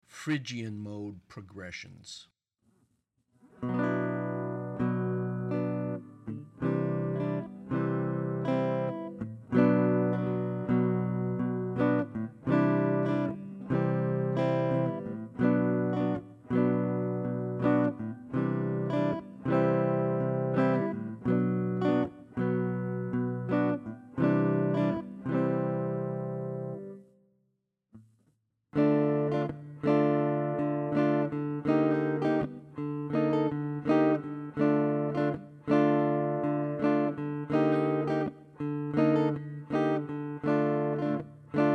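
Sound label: Modal Chord Progs Phrygian, guitar; lead skills materials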